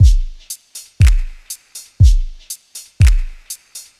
Geez Kit A

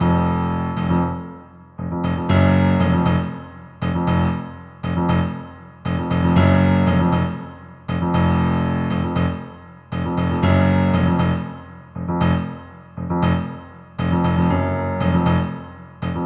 piano, 118 bpm

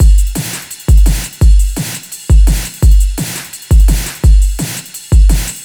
Sustain Beat A, 170 bpm

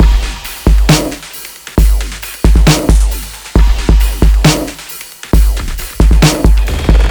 Polar Kit B; Dubstep

